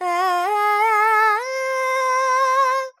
Purvi Kalyani, Vocals, Carnatic Female, Alaps, Dry; Sample Banks